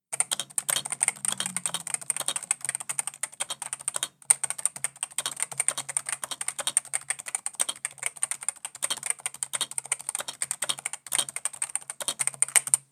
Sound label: topre capacitive